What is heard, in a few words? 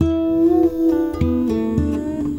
Bendo, Guitar And Synth; Sonic Foundry (Sony Creative Software) - Friendly Fire